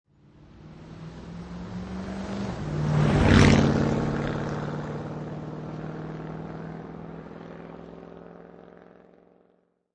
Truck Ford Drive By Right To Left

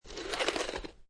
Snow And Ice Ice Skate Slide; Weather

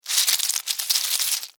Insect Move Wet, Insect, Movement, Wet, Bug; Sound Effects:Animals:Insects

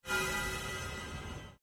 Created Pulse Fade; Sound effects created by manipulating other sounds; Rises and Dives

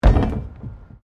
PLASTIC BARRICADE HIT

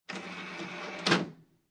Technology, DVD Player, Close Disc Tray; Entertainment System; Entertainment Systems

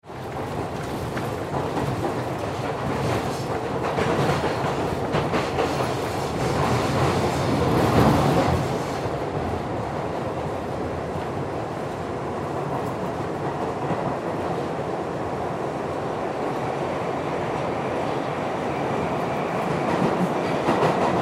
Subway Interior, Subway Interior Ride; Transportation; Subway Sound Effects; Subways (Sound Effects:Vehicles); Blastwave

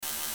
ELECTRICAL ZAP, Short, High, Zip; Special Effect; Production Element, Imaging Element, Accent, Transition; Multimedia; Blastwave

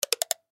Button Switch Gear Multimedia; Web Interactive

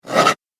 Horror Scratch Wood; Wood Sound Effects

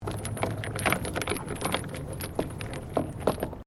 Chain rusty move, Chains rusted from sea air; Metal

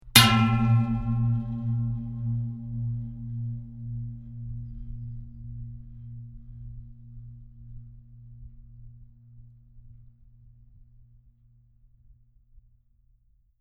Metal Impact Hit Gong Like With Long Ring; Resonating metal pipe impact, long tail; Metal Sound Effects

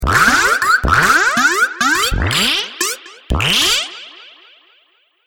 Little Bits Of Laser, laser sound effect

